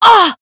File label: pain; jett